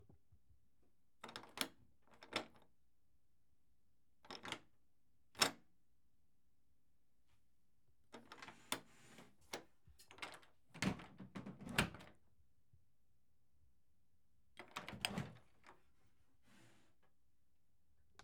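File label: Door Handle Grabbing Door Knob Opening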